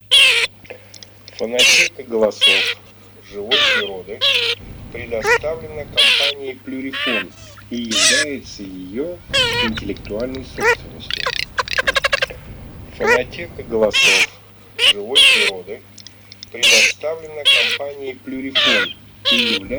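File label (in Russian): Чирок-свистунок Alzavola, манок; plurifon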